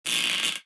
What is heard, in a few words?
bullet energy titan shield spang cluster; Titanfall 2